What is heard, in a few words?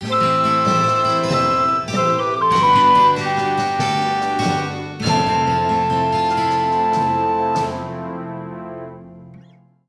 Horse Racing - Cup - Didn't Place; mario sports superstars 2017 3ds gamerip